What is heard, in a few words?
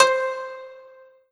Korg TR Rack Koto C5